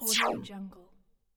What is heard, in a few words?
scifi bullet flyby; stormwaveaudio